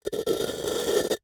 Jar, terracotta, lid, rubbing, scraping, grating, rough, ragged, short; Eiravaein Works; Vaeyan IV